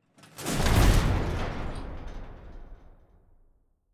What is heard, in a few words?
fire ball burst reverb